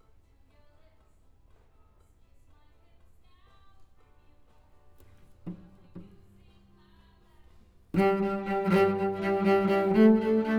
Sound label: Cello